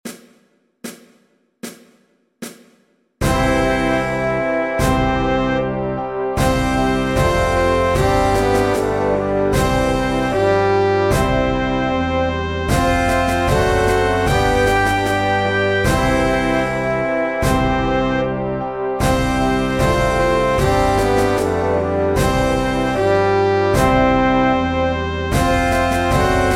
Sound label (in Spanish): Himno de Espana Piano; en do mayor